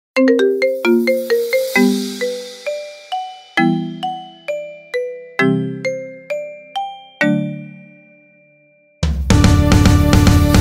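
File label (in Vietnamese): nhạc chuông điện thoại marimba remix; iphone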